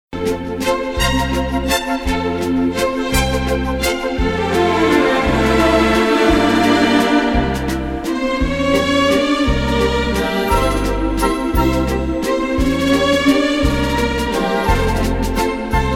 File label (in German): WALZ; kermis geluiden